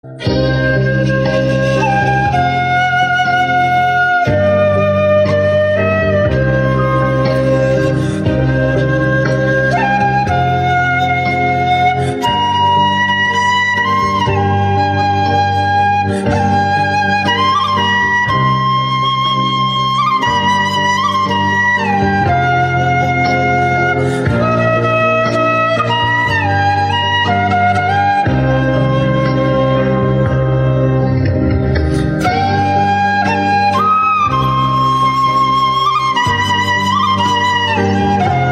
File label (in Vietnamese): nhac chuong